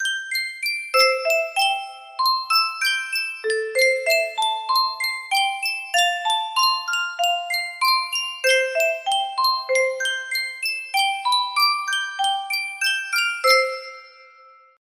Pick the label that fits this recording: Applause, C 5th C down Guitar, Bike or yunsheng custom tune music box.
yunsheng custom tune music box